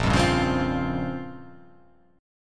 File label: A sharp Minor 6th Add 9th A sharp down, chord